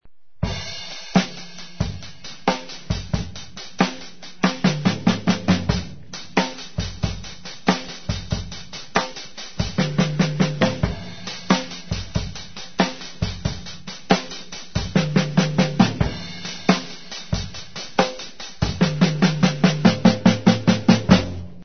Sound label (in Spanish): Sonido de bateria